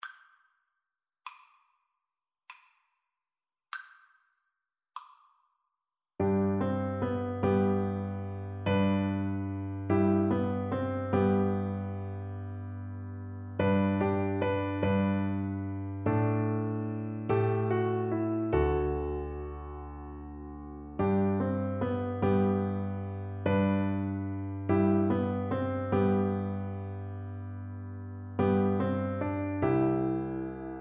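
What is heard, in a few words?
blessed assurance, vibraphone; Percussion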